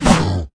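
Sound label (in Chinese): Bear atk, 熊灵布鲁斯攻击音效; 妮塔; 荒野乱斗; 熊灵会用爪子攻击对手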